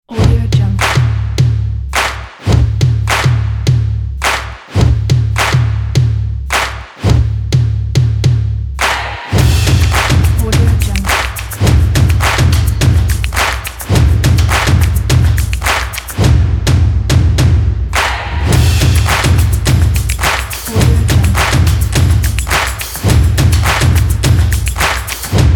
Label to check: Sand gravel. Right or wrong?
Wrong